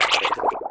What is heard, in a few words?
DROWN; vortex; unit01